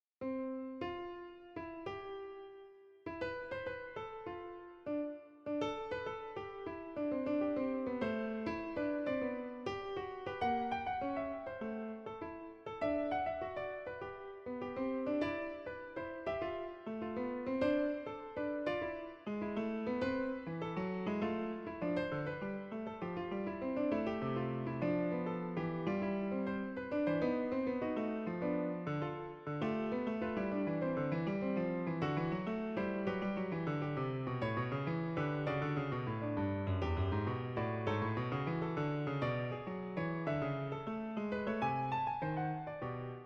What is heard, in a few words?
fugue; fugue trondheim